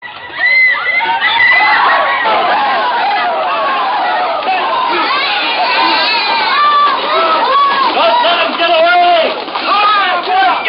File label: riot screams; No Agenda